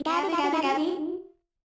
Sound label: turkey attack; project hawk